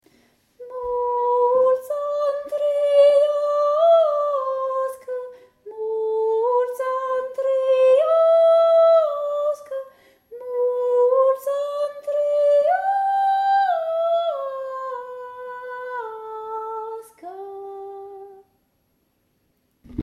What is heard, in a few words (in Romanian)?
multi ani traiasca soprano